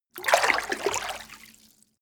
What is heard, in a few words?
water light